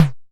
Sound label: Snare Damped Low, Modular; Wiard 300 Series